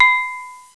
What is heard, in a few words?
click; Walt Disney